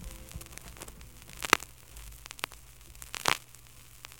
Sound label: VINYL NOISE